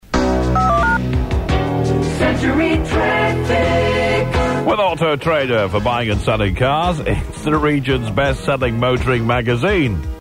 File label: traffic intro; century